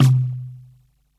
huge tom single hit c key, Royality free tom single hit tuned to the C note.